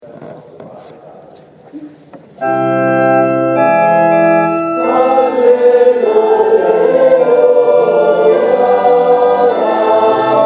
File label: Alleluia, corale